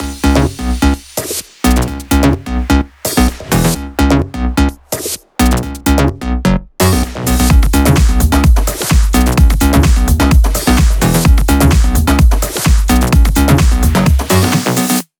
128BPM Mix; Vengeance Samples; Fragile Kit

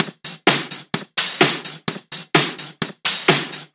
House Loop, 128 BPM; Vengeance Samples

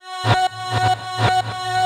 House Loops, Vocal Loops House 128BPM

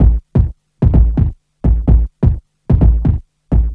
Minimal Loops

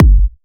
Bassdrum; Vengeance Samples

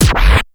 Vinyl FX and Scratches, FX Scratch

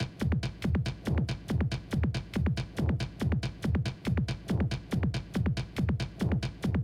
140BPM Genetic Kit

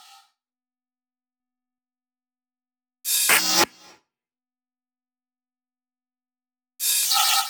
128BPM Samba Kit; VFH1 128BPM E Samba Kit

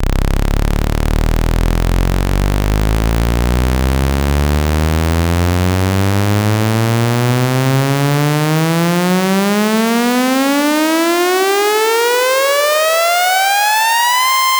Sweeps Long

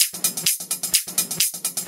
House loops 128BPM; Vengeance Samples

